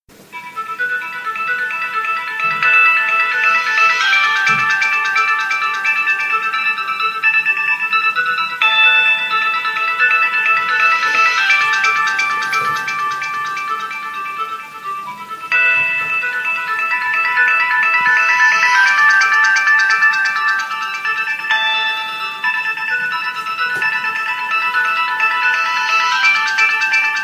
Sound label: Pattern NOKIA 6060